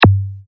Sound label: item rollover; Wreck-It Ralph